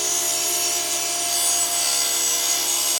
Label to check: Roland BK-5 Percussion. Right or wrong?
Wrong